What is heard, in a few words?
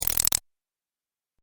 Droid Little Legs; Robot Sound Effects; Sci-Fi Robot Sfx